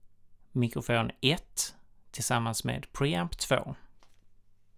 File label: mic pre